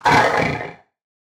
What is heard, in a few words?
damage, fractal guardian; Legacy